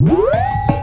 tape fx, scratch; Ambient Grooves

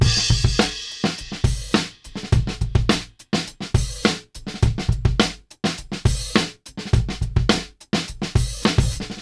Beat Loops, 104; Zero-G; Monster Beats

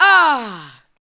ADLIBS

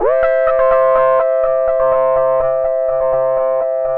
Analog Rhythm; E-MU Producer Series Vol. 6 – Analogia Project (CD 1)

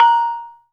Metalofone Bb3

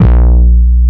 ESQ 1 FAT, SYNBASS; Synthesizers; Soli-Music; World of Synthesizers VOL-1

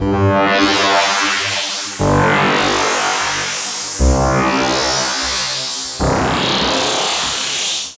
Vectron Synth; Synth SFX; Sonic Foundry (Sony Creative Software); The Electronic Music Manuscript Collection

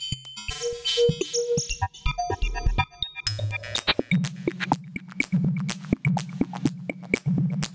dance, Loops; Polyend; Loops n trouble

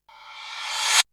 Reverse Crash, Drums; ZERO G - Datafile DISC 3

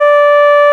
D5, BRASS

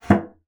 bassoon slap; Xsample VOL-6; C BASSOON

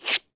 SANDPAPER

